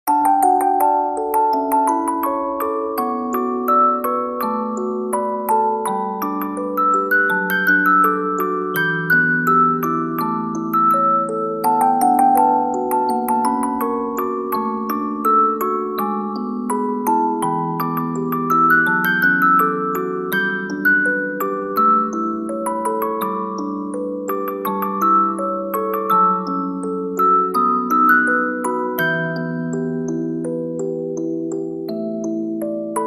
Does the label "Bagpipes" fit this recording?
No